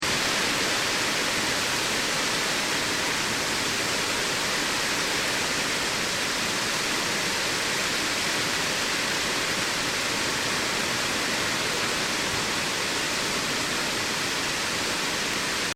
Storm Rain; Weather; Crafted Signals

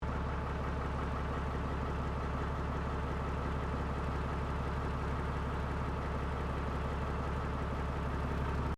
Bus Double Decker Bristol VR Drive Idle; Bristol Vr 1971; Bellarine Tourist railway vehicles; Busses

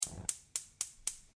Household Stove Gas Ignite Run Off, kitchen; Kitchen Sound Effects; Kitchen (Sound Effects:Household); SFX Bible 6

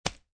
Poker Chip Dropping On Playing Cards

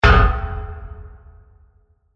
Hit Metallic Dark; Sound Design; BlastWave

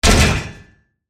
Hit Metallic Slam, Lock; Production Element, Imaging Element, Accent, Transition

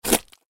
Mud Movement, Mud Suction Wet Moist Juicy; Squishes; Foley; Sound Effects:Objects; SoundEffects-Blastwave

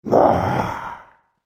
Waah Evil Wolf Man, monster; horror sound effect

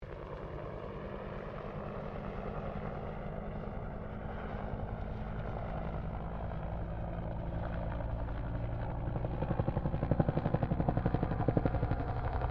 HELICOPTER 1967 BELL UH1 IROQUIOS PASS DISTANT; RAN UH-1 Iroguios; Vehicles; Aircraft; Helicopter Sound Effects; Helicopters (Sound Effects:Vehicles)

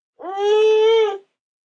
Baby Whine, Baby fussing, 1 yr., short moan; human voice, kid, child, infant; Talking Sound Effects

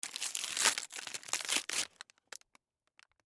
Cupcake Open Wrapper Snack Junk; Cupcake Open Wrapper Small Snack Junk Food; Foley; Blastwave

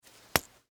Spring Jacket, Button, Unsnap; Foley, Clothes; Dressing; BlastWave

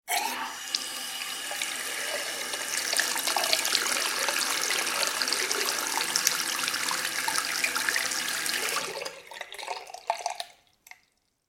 household sink water fill; Sink Filling With Water From Tap; Kitchen (Sound Effects:Household); McKinney Sound

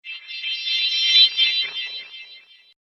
Impulsive Magic, magic sound effects; fantasy sound effect; Andrew Fleming

